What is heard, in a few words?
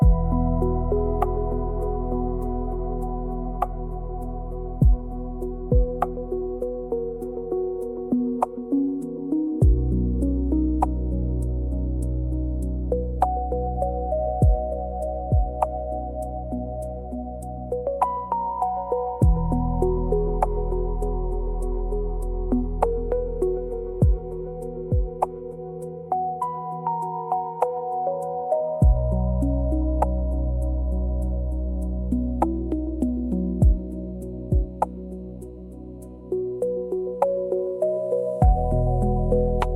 music home